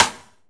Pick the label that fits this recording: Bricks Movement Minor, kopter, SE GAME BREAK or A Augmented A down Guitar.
SE GAME BREAK